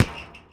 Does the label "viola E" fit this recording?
No